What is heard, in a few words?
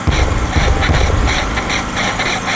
generator damaged